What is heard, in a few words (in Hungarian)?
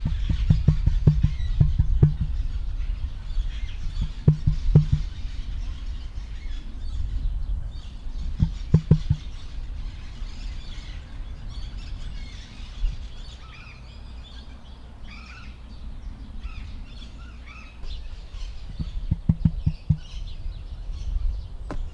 finoman, emu; marantz puska; debreceni zoo 2012